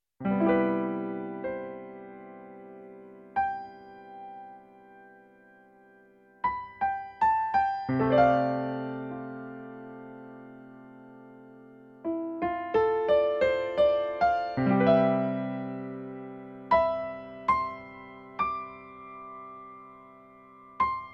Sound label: Piano